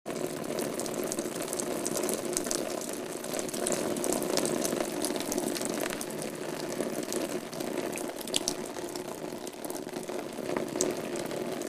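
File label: Rain Light On Raincoat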